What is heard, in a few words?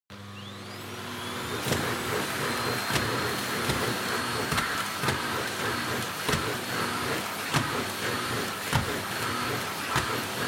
Vacuum Cleaner Vacuum Floor Wood High